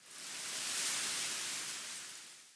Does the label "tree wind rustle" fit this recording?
Yes